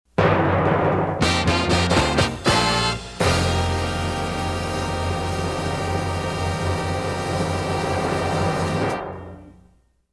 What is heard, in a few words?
drum roll clear; PAMS; WCBS-FM